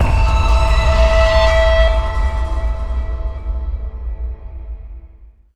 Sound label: Fireworks Overlay Combat; battlefield hardline; ps3 ps4 windows xbox 360 xbox one